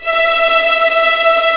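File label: E5 tremolo, String; East West; The Ultimate String Collection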